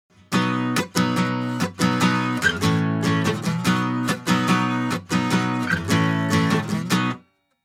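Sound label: Shure MV7 Acoustic Guitar Strumming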